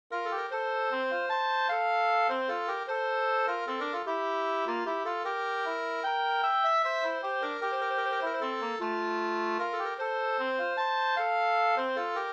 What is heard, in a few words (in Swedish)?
vals; Blinda Kalles vals e Albert Bostrom e Carl Gustaf Axelsson Flodafors